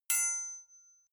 knife hit metal ring

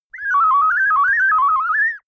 ocarina randomness short

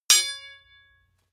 hammer hit metal ring bright

